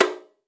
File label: shime hi, Japanese Drums; ETHNIC and WORLD PERCUSSION